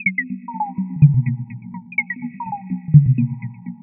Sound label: synth 125 twinkle C Maj; Transmission-X